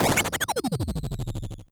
waza hit lowbit

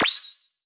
exclamation mark high

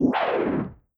beast roar

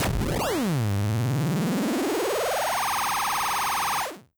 charge lowbit

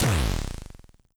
synthesizer lowbit bass

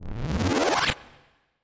strengthen no echo

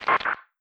filtered noise

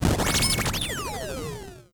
laser cannon

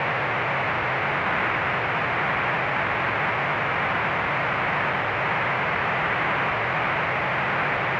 synth noise loop

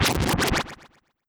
haste magic

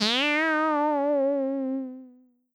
cat meow synth low pitched

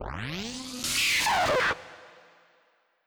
teleportation machine send

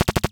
standard shot x4 loop

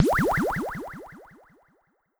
thought bubble cloud

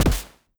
hit lowbit fast

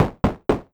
door knock wood synth fast

